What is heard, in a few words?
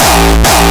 Short Hardcore Kick